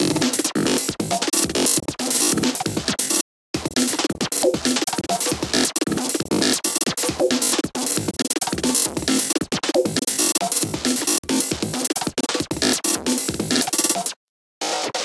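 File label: drum beat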